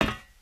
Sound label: metal plate, human step